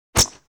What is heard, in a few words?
swish single; Asian Show Sound Effects